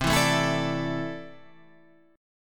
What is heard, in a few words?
C 6th Add 9th, C, down, Guitar, Half Step Down